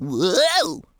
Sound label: corner, impact